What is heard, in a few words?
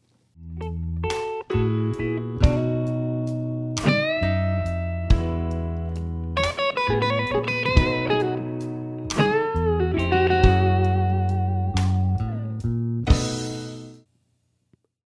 Slow Blues in G Lick, guitar; 19 Blues Licks